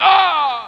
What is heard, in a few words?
scream